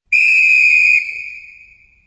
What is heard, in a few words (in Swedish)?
visselpipa; sportstats